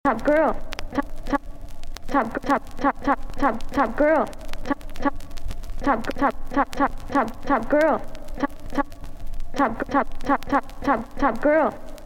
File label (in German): Schwer Electronisch